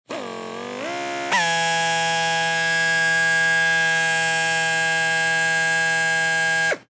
Air Drill Run Squeak; Machinery; Machines; INDUSTRY; Blastwave

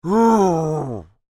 Creature Moan Upset, Human; Groaning Sound Effects; Voice; Big Room Sound

